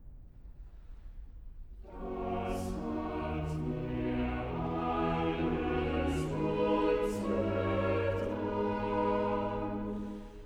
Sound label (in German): Choral; Cantata, BWV 64 'Sehet, welch eine Liebe hat uns der Vater erzeiget'; Bach; New York; 3rd Day of Xmas